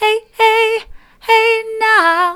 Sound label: hey now soft, vocal